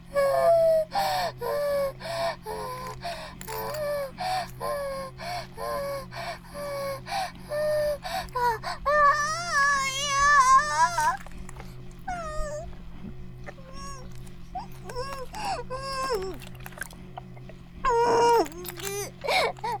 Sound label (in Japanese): バイブ放置; イクたびにわけて